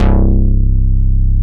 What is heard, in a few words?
DANCE C1, FM BASS; AKAI S-Series CD-ROM Sound Library VOL-7